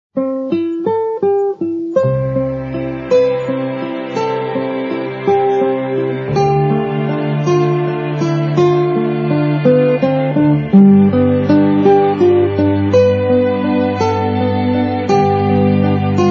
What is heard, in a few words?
Orchestra; Free Sound Orchestra